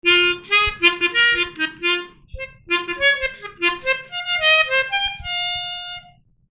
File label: Melodica; Old recordings; 1986